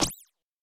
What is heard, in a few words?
jump plastic; fallguys